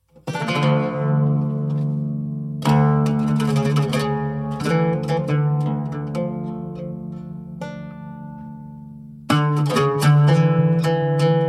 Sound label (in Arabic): تقاسيم عود; taqasim